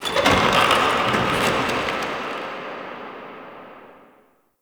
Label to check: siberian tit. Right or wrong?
Wrong